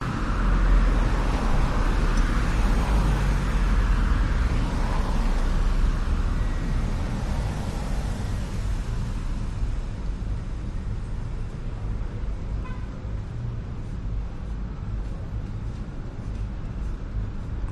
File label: vancouver night traffic hissing rumbling roaring traffic bicycles cars bus; davie st; engines; your free sounds